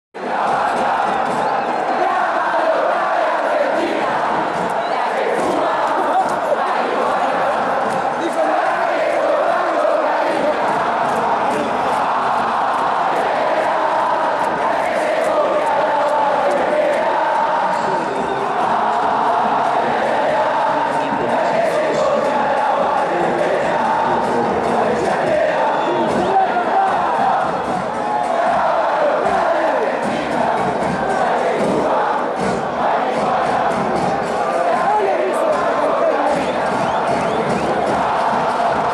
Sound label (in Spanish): avellaneda hay una banda, chants